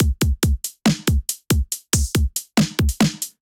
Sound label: Nectarine Kit groove 140 bpm; MIDI Clips; Drive and Glow; Ableton; Factory Packs